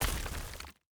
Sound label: block destruction stone